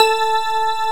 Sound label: DIDI, SYNTHESIZER, DIGI WAVE